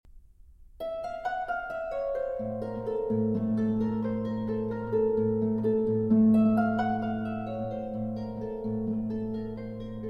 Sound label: Suite For Flute & Harp; Hovhaness; Op. 245 The Garden of Adonis